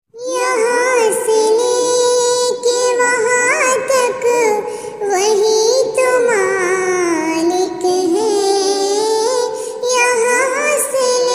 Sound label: islamic ringtones